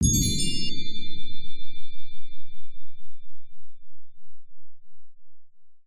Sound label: Combo Low